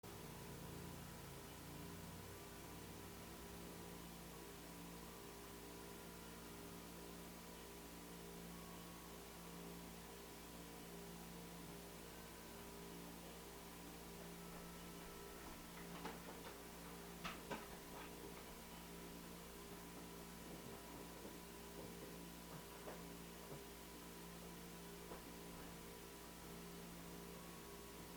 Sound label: Creaking, knocking; Devoe House; EVP